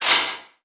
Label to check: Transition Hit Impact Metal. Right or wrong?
Right